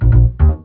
Bass Riff; Levels Hub Bayou; Music Riverboat

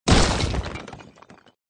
Sfx Enemy Golem Dead; Mighty Knight 2

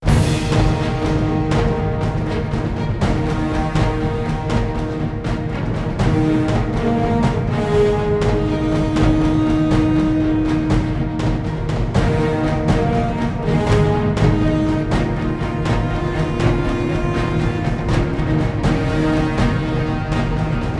victory human; Armies of Exigo

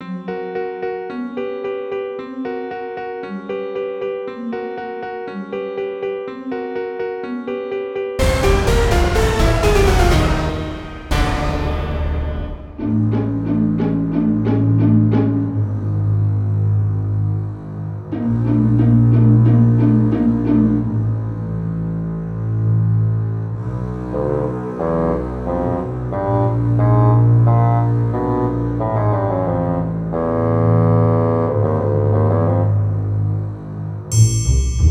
BGM; Heroland

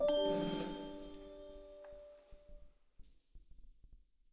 stinger very small; Adam's Venture Origins

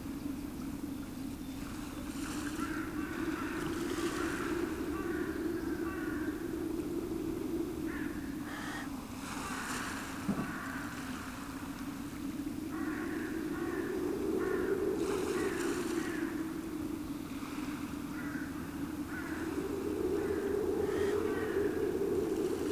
Village Sad Loop; skykeepers; ps4 windows xbox one gamerip 2017

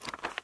Shop Page; Farm Mania

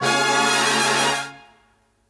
EXPLOSION

